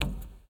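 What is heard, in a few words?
Foot Metal Roof Bare Walk; Lucius III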